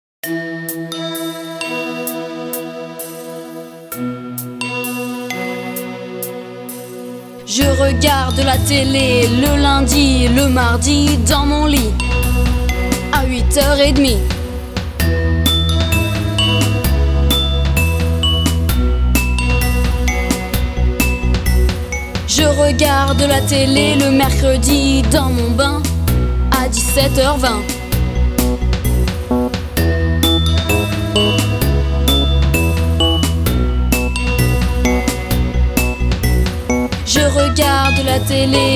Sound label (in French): RAP; Alex et Zoé 3